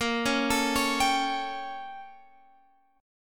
A sharp Minor Major 13th, A sharp, down, Guitar, Open G